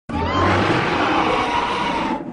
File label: Roar; Night Fury